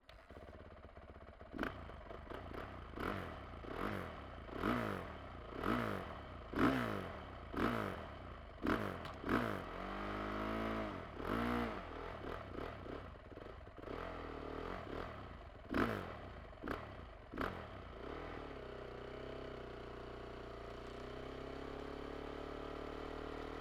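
Honda 450R, Onboard, Medium, Drive, Various, Engine, Left; Honda CRF450R 2015; Pole Position; PZM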